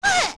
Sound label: Twinrova Halo; OOT